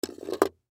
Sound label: Sun Glasses Close, Sunglasses Closed Set Down Table; Set Downs; Foley; Blastwave